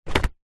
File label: office plastic document folder wallet set down surface